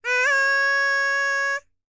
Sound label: HIMALAYAN, World Voices; ILIO; Vocal Planet VOL-5